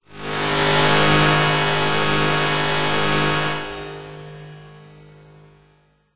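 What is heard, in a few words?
sound design harsh metallic short drone reverb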